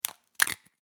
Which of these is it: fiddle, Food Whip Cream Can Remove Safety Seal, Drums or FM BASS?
Food Whip Cream Can Remove Safety Seal